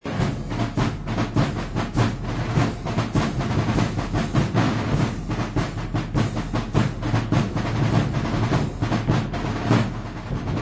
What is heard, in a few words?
Jig